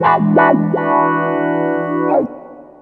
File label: Wah Wah